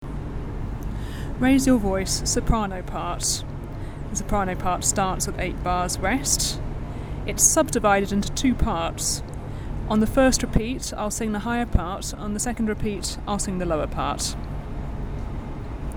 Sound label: soprano